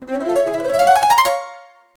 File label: major jazz ascending arpe; cello